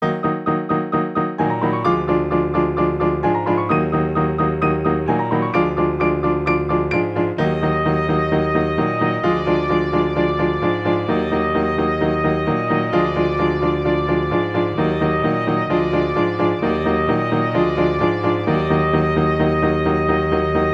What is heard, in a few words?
Fe Fi Fo B flat